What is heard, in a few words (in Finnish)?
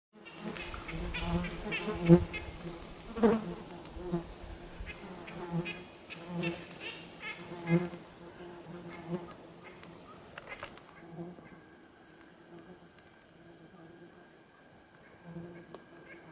tavi emo; emon kutsu; linnut